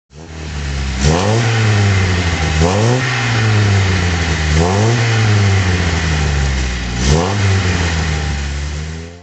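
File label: volvo p1800 throttle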